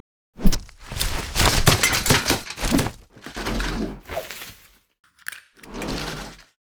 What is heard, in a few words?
recall leadin; leona skin04; LoL; leesin skin05